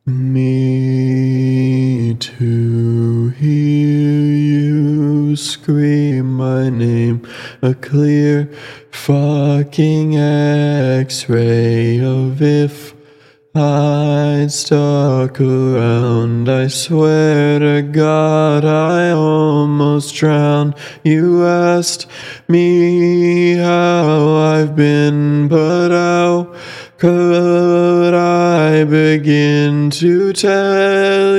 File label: fun autotune